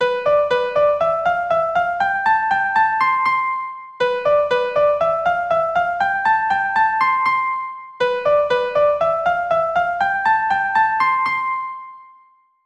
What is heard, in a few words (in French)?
carillon